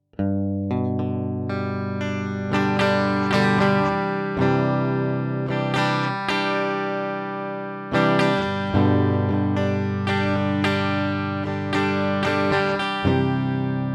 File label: Lindell RE-51 Telecaster